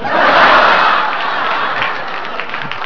LACHEN

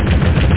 hammer ablegen; 8-bit Sampled Voice; 8,363 sample rate; 0.08 seconds

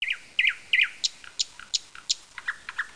Vogel; Anno

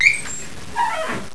tuer auf; dorf

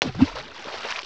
platsch

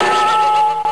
dong

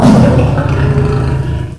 löwe rechts; Amiga-CD - Volume 2; dolbytest

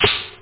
Takt; OctaMED SoundStudio V1; drums snare

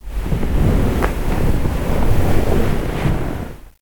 Bettgerausch; Singles; French Version

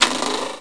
tuer auf; dorf

